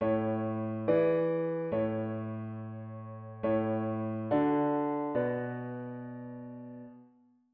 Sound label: diat root w bass